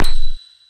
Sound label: piano hit